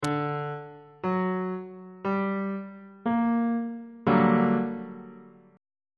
f double sharp minor major 7th 2nd inversion on bass clef